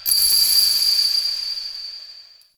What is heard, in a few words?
Interior Orbital Station Ring; Warframe; Tau